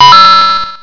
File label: level up